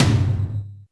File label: Yamaha RM 50 Toms